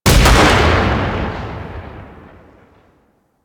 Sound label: Sniper Shot; Kahl Sniper